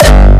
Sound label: hardstyle kick g